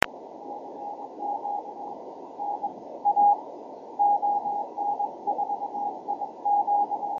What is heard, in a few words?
50.084 MHz CW, ham radio; ON4IQ Belgium